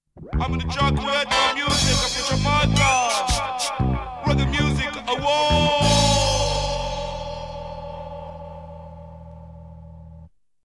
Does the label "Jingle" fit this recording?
Yes